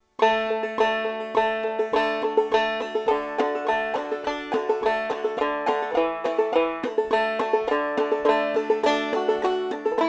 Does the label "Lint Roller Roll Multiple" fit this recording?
No